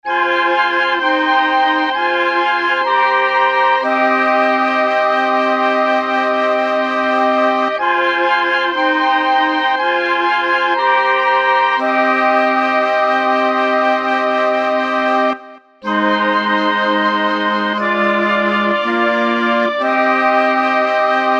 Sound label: Woodwind Clarinet; gforce software